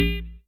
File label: PEEPER, Drum Machines, Effect; Yamaha DTXpress